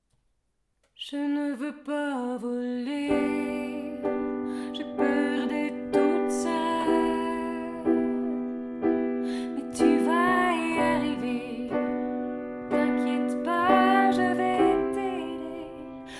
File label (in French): Je ne veux pas voler piano chant